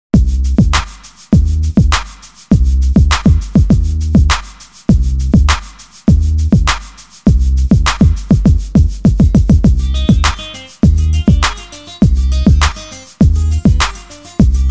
Good Groove; Soundzabound Volume 5

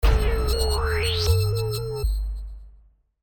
Burst Sweeper

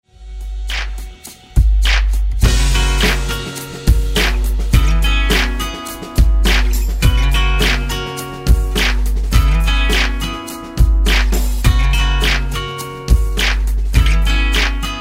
no vocals outro

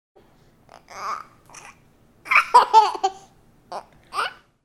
Baby; Soundzabound

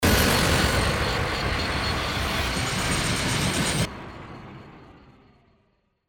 Burst Digital

